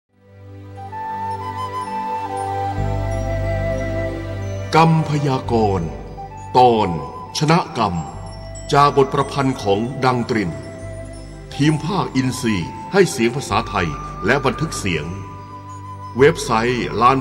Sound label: Jingle